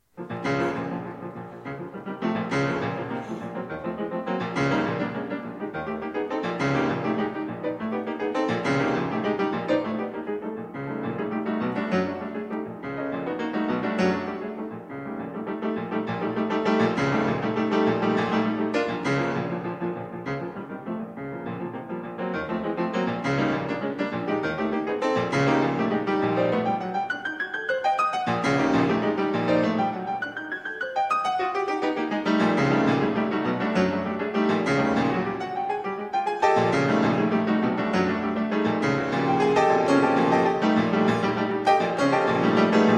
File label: Piano Sonata in B-Flat Major, Precipitato; Prokofiev; Piano Sonata No. 7; Op. 83 III.